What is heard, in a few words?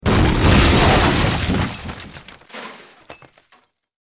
Medium Impact W Debri, metal & glass